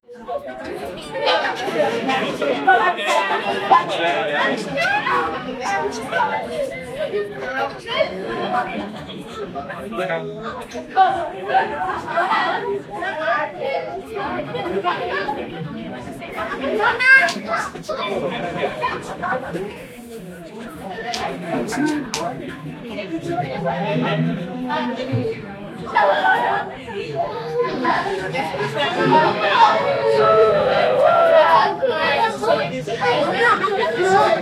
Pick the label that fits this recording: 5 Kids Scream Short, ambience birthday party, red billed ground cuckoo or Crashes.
ambience birthday party